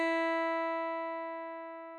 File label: E4 329.63Hz 8 string; tuner